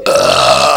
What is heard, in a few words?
burp